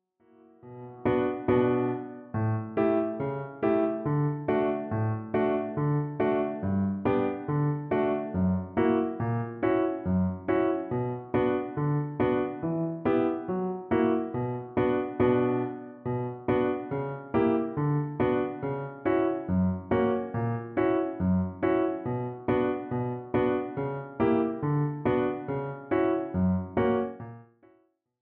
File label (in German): gavotte 5 lage